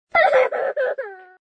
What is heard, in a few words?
Sad Sob; F.A.M.P.S